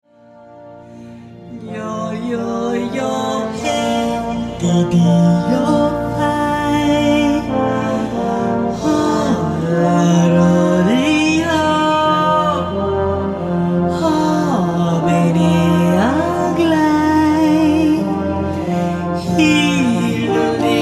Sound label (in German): Jodler, Yodeler